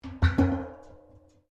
Pipes Metal Impact Tyre; Materials

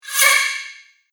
Poltergeist On The Move, ghost; Sinister, Scary, Spooky Or Just Silly; Andrew Fleming

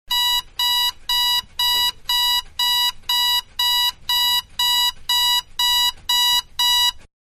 Alarm Clock Electric; Clocks; Machines; Clock Alarm-Electric 2; Manhattan Production Music

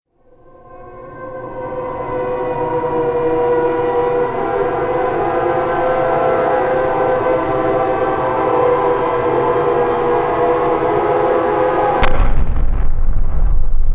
Movie Impact Howling; Miscellaneous Impact Sound Effects

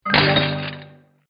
Spell Create Golem, magic sound effects

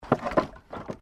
Wood Movement Minor

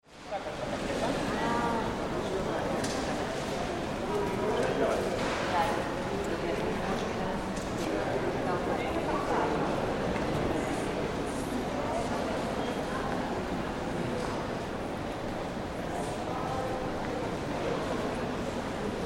Ambience Train Station Switzerland; Backgrounds

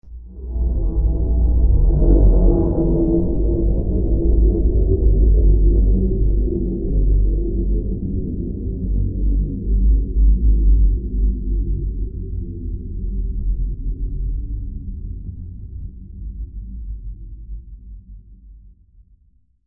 Extrem Low And Long Transition; Extrem Low And Long Transition Effect; Scary Soundscape Sound Effects

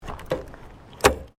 Car Ford Focus Bonnet Key Cover Close; Ford Focus hire car; Cars (Sound Effects:Vehicles)

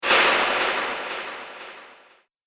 Mage Puff Of Smoke, magic sound effect; Magic Sound Effects; Andrew Fleming

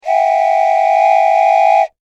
Foley Toy Train Whistle Blow

